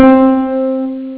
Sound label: Ring Piano